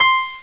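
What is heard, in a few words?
grand piano C5; Alone In The Dark Demo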